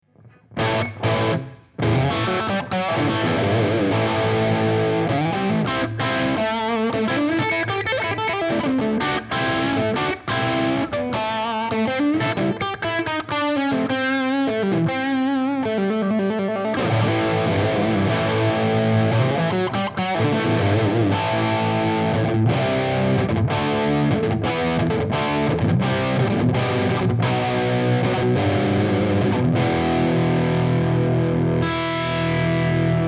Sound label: 50w canal lead